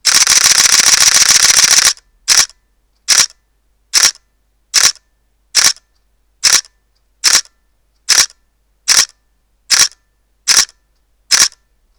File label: Alpha DSLR-A550 continuous hi RAW; Sony